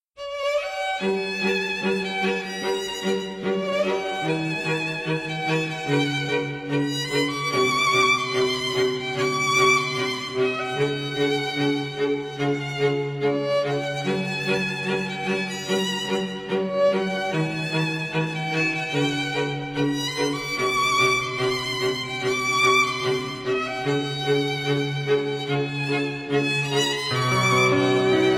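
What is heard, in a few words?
violin shuffle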